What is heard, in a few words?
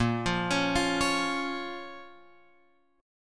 Bb Minor Bb down Guitar Open F; arpeggiated; JGuitar